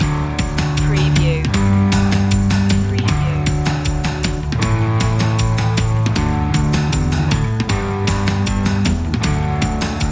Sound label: free flowing loop